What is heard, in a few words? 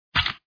Tower Place Snd; btd4